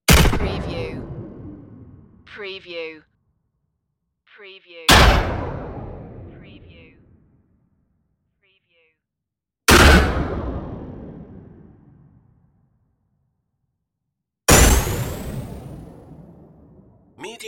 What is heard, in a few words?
sci-fi battle impacts